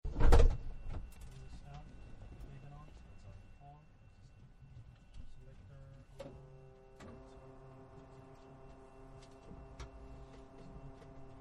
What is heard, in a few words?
Prop Plane Sequence; Airplanes; Vehicles; Partners In Rhyme